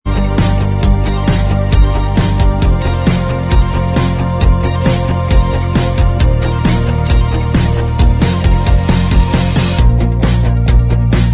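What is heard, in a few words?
instrumental track, backing tracks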